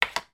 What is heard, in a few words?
instant coffee jar lid put down on surface; food drink